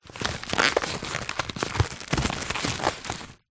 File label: foley plastic bubble packaging cushion handling; zapsplat